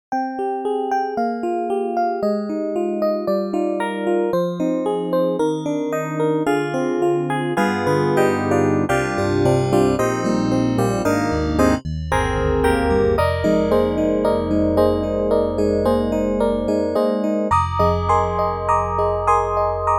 Rhodes boosted tine; EastWest; Quantum Leap Goliath; GS-1

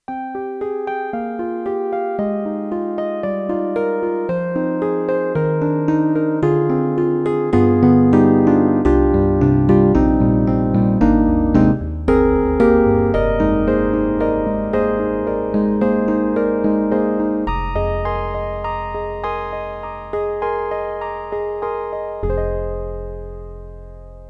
YAMAHA MU1000EX Mello EP

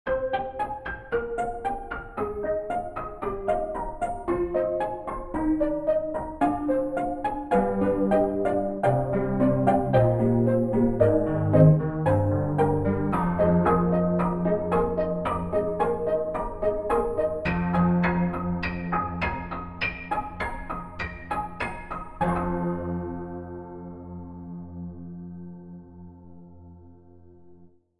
Bloken Piano; 2Spirits

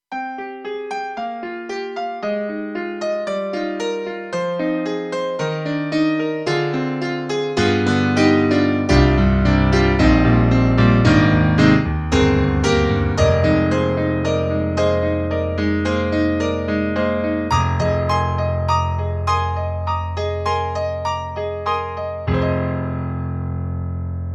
Rockin' Piano; KORG EXB-PCM08; TRITON-Rack

